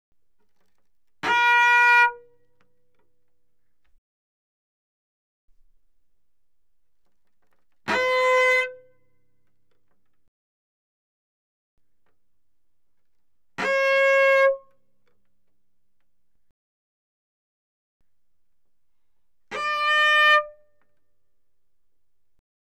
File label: Cello arco ff sul D B4D5; Strings